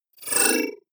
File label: disappear flicker; maschinen; signale; soundpool